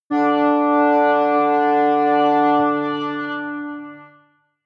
synth tremble bass high

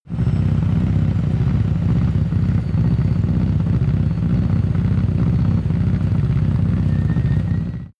car subaru impreza idle

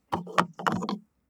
car bmw e46 exterior gas cap spin open

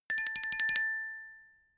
glockenspiel tremolo low octave short; percussion

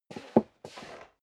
step leather shoes wood creak scuff; schritte

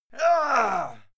male fight attack; soundpool

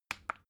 bottle syrup upend bright; berufe; gastronomie

